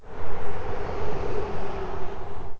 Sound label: truck tyre minus 3 dB